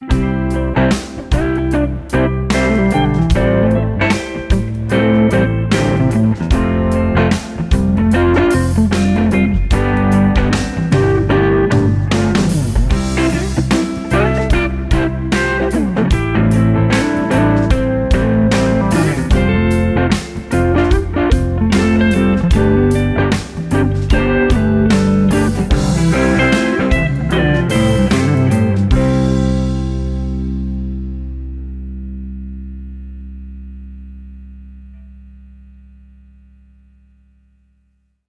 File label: Relaxed Modern Pop Rock